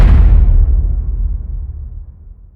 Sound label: Samsung Galaxy M12 Notification Ringtone; (India)